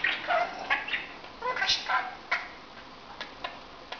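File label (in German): sprechen, wellensittich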